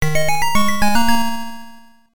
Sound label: Bonus Start; ninja kid